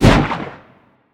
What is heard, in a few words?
fire; smite; scylla